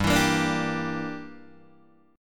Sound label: G Minor 6th, Guitar Open D